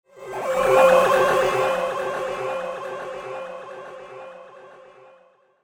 Teleport Whoosh; Whoosh Sound Effects; Andrew Fleming

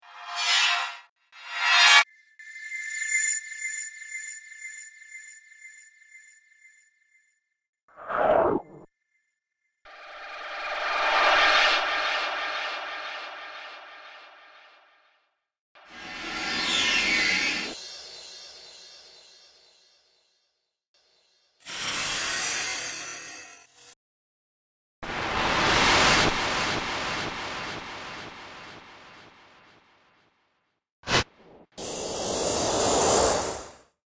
Whoosh Pack 10 Whooshes; Whoosh Sound Effects

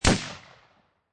Machine Gun Ak 47 Single Shot; Machine Guns; Sound Effects:Guns; Lynne Music

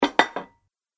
Medium Sized Dinner Plate Slowly Placed On Table; Dishware; Household Sound Effect

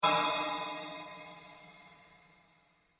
Created pulse; Various sweeps, pulses and drones; Rise And Dive; Rises and Dives; Sound Design

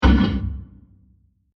Diffuse Scratch Hit; Bursts; Impacts; CraftedSignals

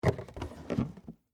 Game Box Lid, Board, Close; Sports; BlastWave

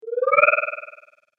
Magic Power Up

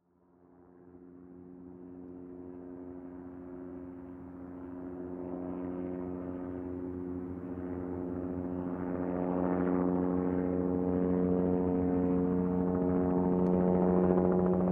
Helicopter Fly Overhead; News Distant Long Pass By; News Chopper Distant; Transportation Aircraft; Helicopters

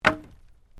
Wood Planks Fall

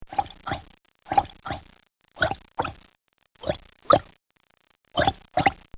Cartoon Sound Effects Plops

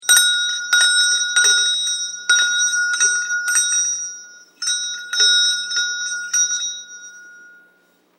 Indian Bells Hyderadad; Musical

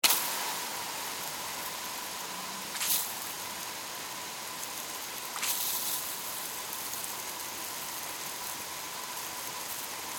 Water Spray Garden Hose Centre; Garden Hose Gun; Centre Setting; Spraying; Objects; Big Room Sound

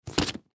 Camera Case Set Down; Digital Olympus Camedia C720; Camera Sound Effects; Technology

